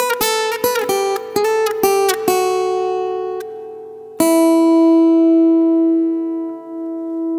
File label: Guitar 130 BPM (E Minor), Acoustic Guitar; Space